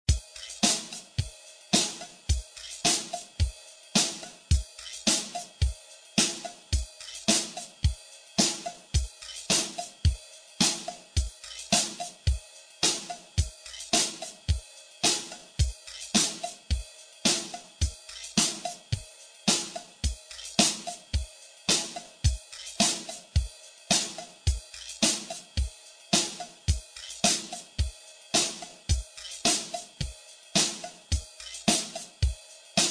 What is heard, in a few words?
drums